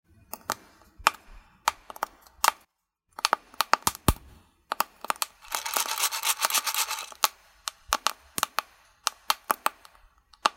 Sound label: explode